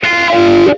window question; jam; stereo

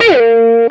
battery low; jam; stereo

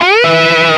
window unminimized; jam; stereo

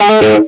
network connectivity established; jam; stereo

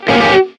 message new instant; jam; stereo

